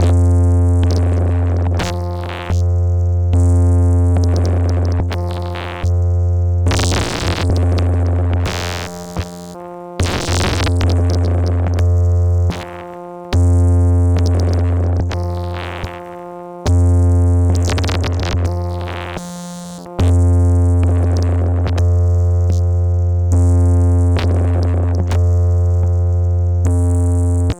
D72 Rhythm

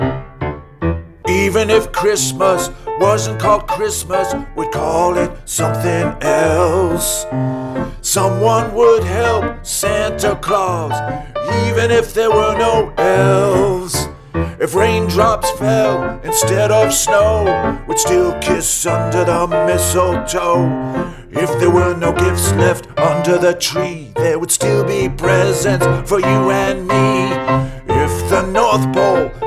Under The Tree Ragtime Mix

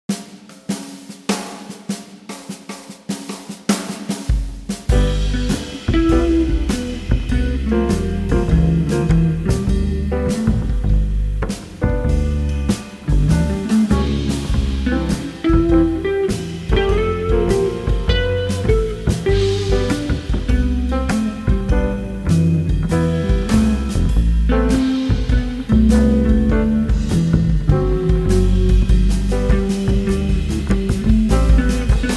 Summer rain blues

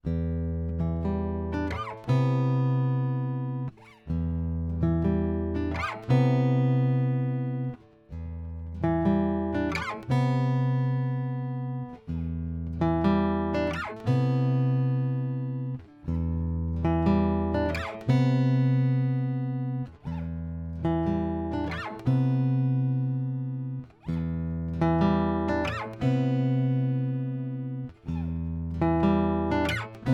Em120 ARPEGGIOS dry; Flavourtones

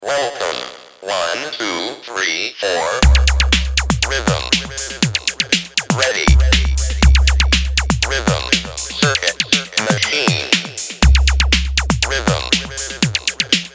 Electronic

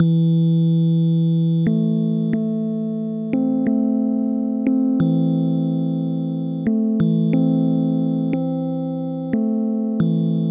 notes seed

